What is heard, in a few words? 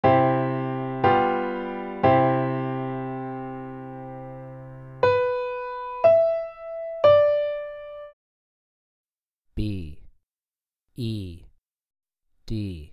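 3 Note Melodic Piano Major b3 e4 d4; ear training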